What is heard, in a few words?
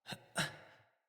Dismount, Barks; Battle; Envoy